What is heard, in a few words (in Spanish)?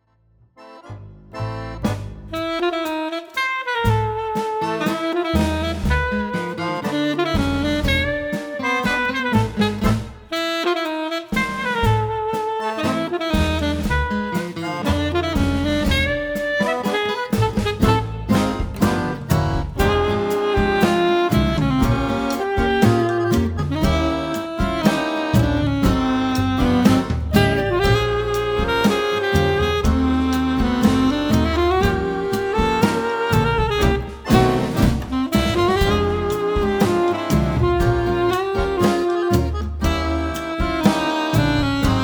Tango Gitano